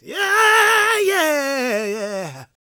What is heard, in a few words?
GOSPEL MALE; Spectrasonics Vocal Planet